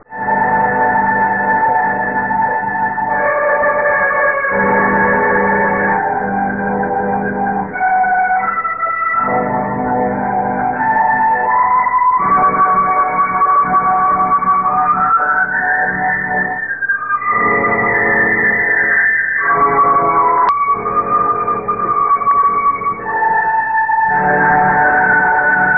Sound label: piano